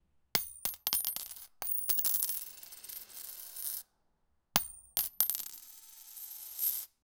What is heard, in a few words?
the sound of falling pile, currency